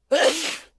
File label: sneeze